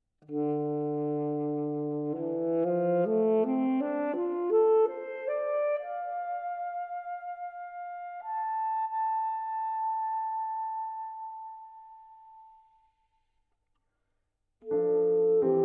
English Folk-Song Larghetto

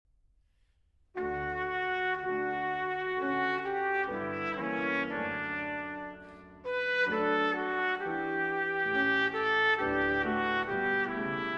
Sarabande, Cello Suite; Cello Suite No. 6, Arr. Fryer

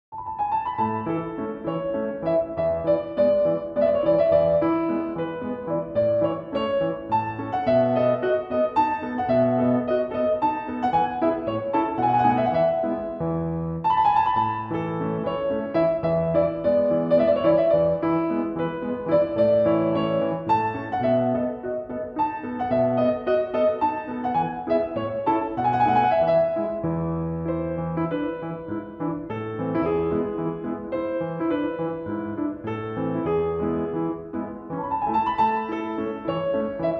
String Quintet in E Major, Minuet in A Major; Op. 11 No. 5, G275; Arr. By Bauer

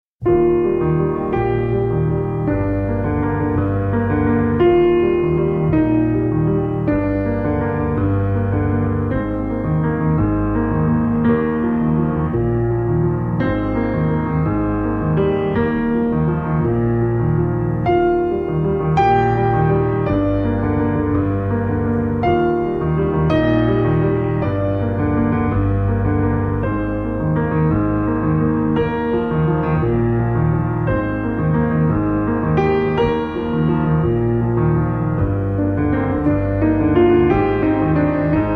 Solo Piano

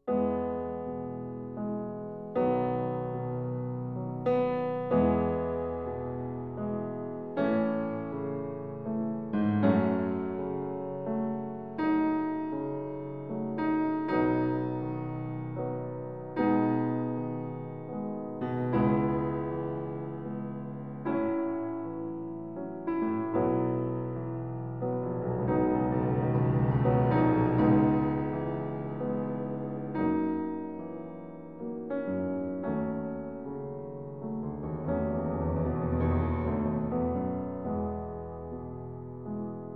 Preludes, Largo in E Major

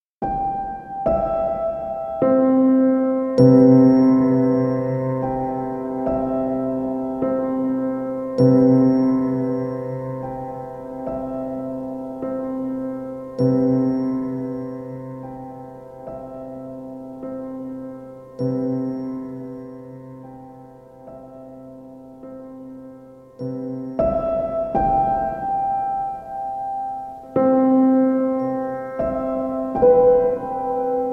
Piano Sounds

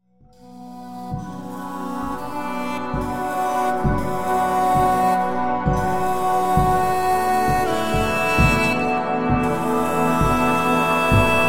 Warm Yoga

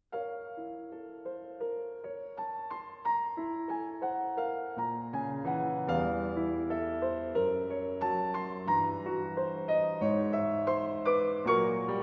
Caprices For Piano No. 1 in B-Flat Minor

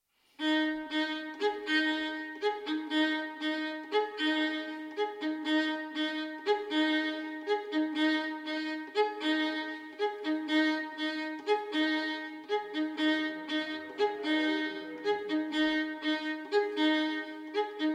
String Quartet

